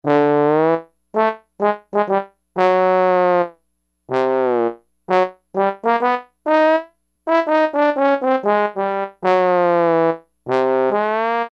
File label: Trombone 2, E-mu Proteus-1; Presets